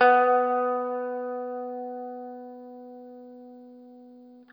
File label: Guitar & Bass; Quantum Leap; 90's Sample CDs